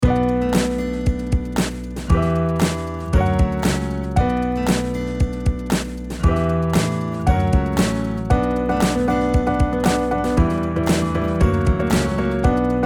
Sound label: ad keys